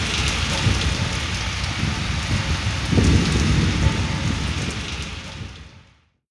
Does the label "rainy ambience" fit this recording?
Yes